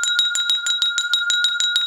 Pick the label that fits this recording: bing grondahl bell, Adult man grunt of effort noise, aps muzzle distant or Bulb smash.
bing grondahl bell